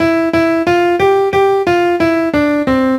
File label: piano